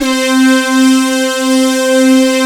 BRAS, Moog Synths; ORSON; E-MU Formula 4000 Series Vol. 3 – Analog Odyssey

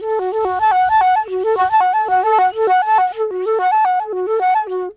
FLUTE FX; Greytsounds - Philip Wolfe David Crozier's Saxophones

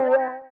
VIBRA WAH, guitar; Spectrasonics; Bizarre Guitar

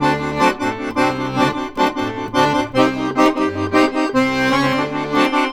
ACC, Balkanish Traditions

